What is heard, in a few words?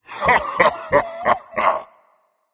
laugh; cerberus